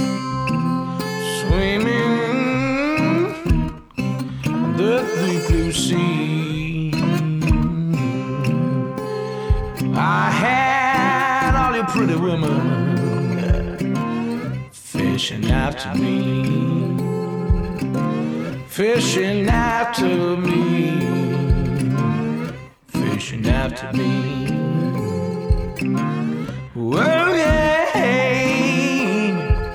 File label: Catfish Blues